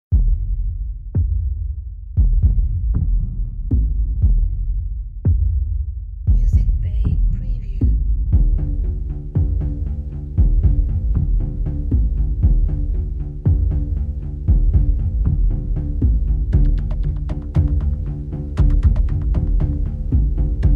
Suspense

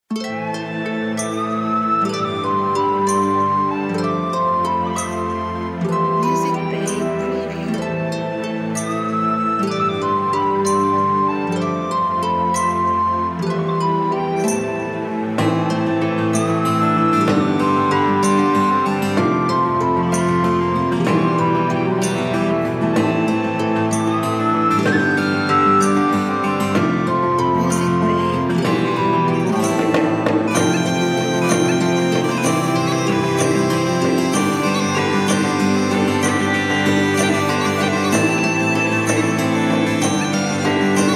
Celtic Ballad

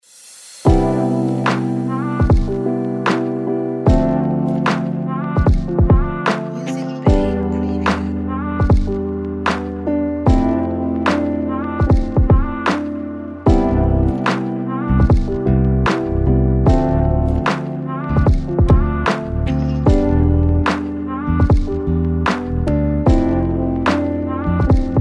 Lofi Hip Hop Jazz

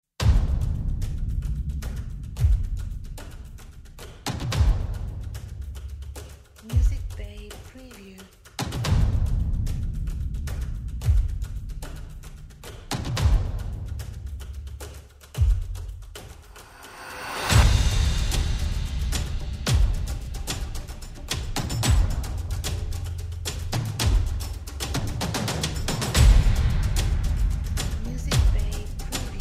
Amazing World Drums

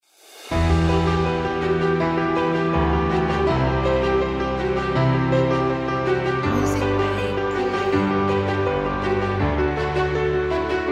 Epic Inspiring Motivation

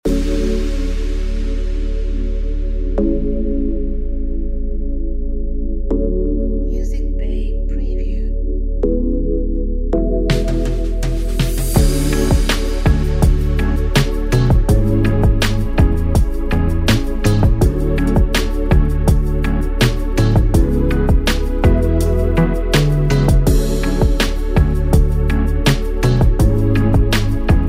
Chill Lounge Sensual RnB

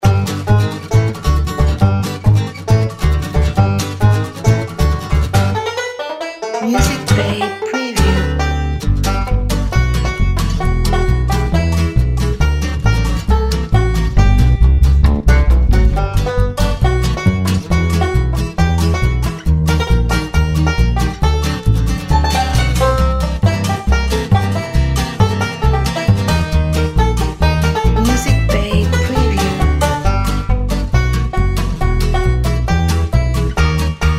Hillbilly Comedy Ride

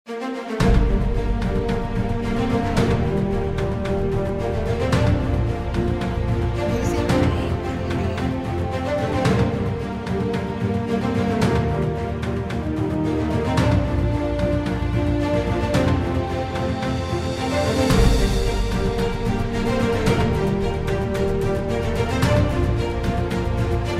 Epic Adventure Trailer

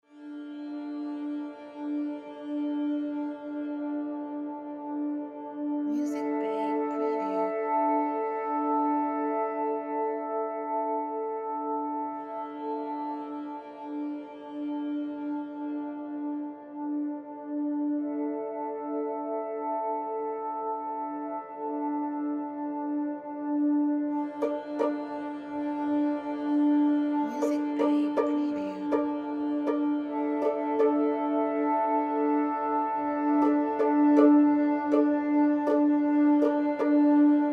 Documentary Tension Ambience

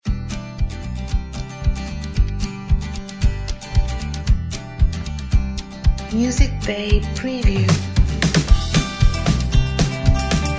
folk energy

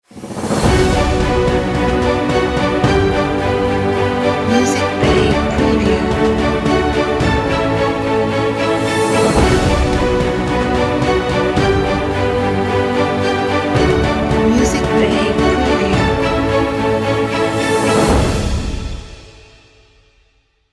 Adventure Epic Opener

